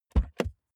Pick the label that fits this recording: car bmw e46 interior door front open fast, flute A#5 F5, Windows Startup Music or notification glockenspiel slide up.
car bmw e46 interior door front open fast